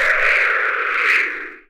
exhale, zaps and beeps

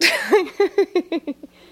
laugh

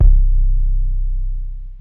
kicks heartbeats

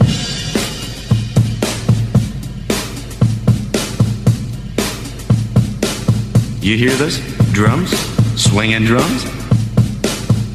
swingin drums, drum loops, funky

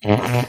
fart short

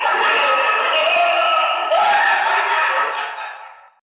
laugh haunted house; Voice; ooh ahh; disney haunted house

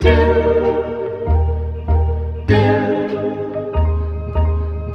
piano and voice doom, Phonky loops

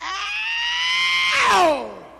ow scratch; ooh ahh; ow ouch

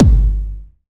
Kicks Electro

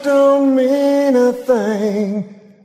sung, expletives

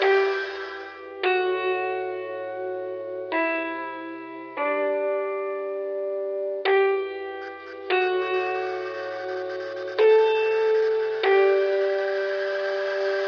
piano, Sad Loops

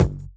farted; Kicks

